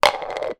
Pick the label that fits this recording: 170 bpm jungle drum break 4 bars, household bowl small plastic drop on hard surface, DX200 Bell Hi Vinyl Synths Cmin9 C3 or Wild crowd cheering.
household bowl small plastic drop on hard surface